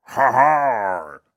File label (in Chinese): 掠夺者庆祝声